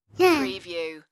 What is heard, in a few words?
Baby Sound; Baby sound effects for use in multimedia projects.